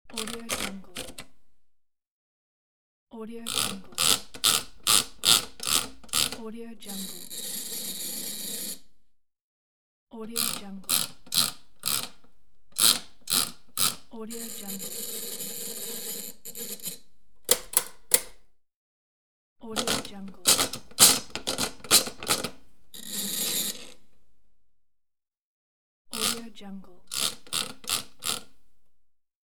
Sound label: typewriter carriage roll